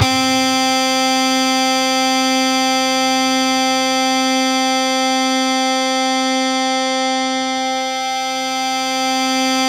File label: C4, Synth Line; 90 s Sample CDs; NiceBeats; SynthLine VOL-33